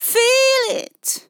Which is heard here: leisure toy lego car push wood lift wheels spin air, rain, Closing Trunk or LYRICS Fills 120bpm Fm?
LYRICS Fills 120bpm Fm